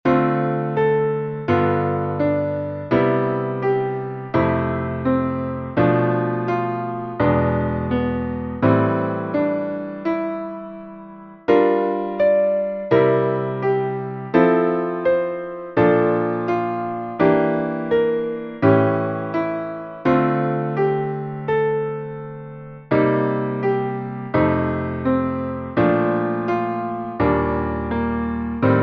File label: Chord Voicings Seventh Chords, Piano; Piano Warm-Ups; Chord Voicings: Seventh-Chords 3; Piano Warm-Ups V3a